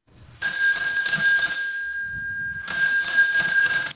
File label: bright metallic bicycle bell ringing